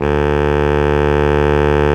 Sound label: SPIT SAX D2; Greytsounds - Scott Peers Sound Engineering